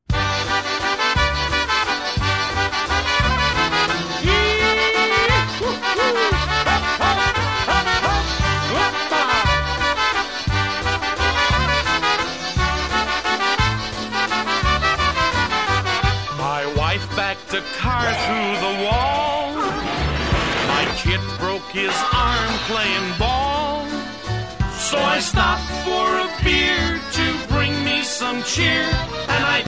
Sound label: Oberek